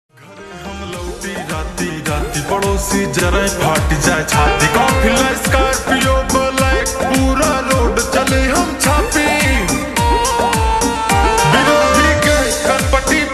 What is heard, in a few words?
Slowed Reverb Ringtone